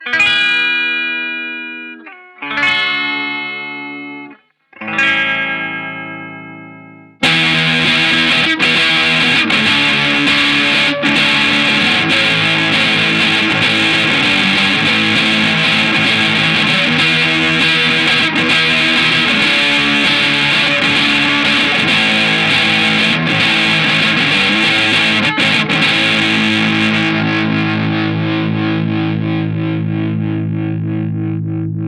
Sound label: bridge rithm, SOLDANO